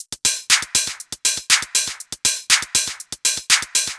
Combo Hat

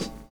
OLD SNARE; Univers Sons

